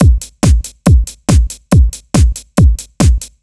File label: Kick Loop, 140bpm